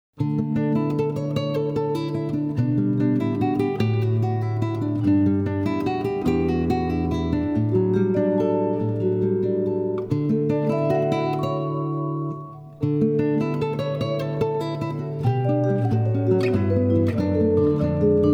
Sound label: Duet